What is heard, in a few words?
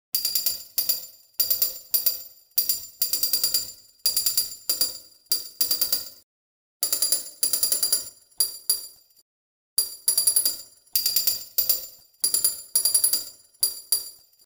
Tap-Tap; knock code charts; ancient alphabets; mystery theatre